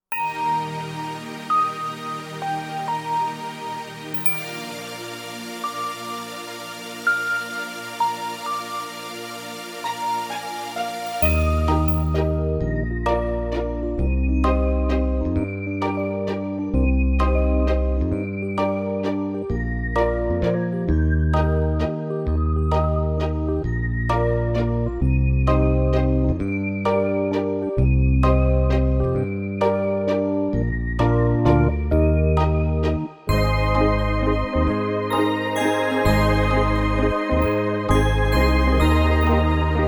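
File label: minus Eb major alto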